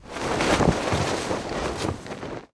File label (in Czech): nadrazak; Mafie 1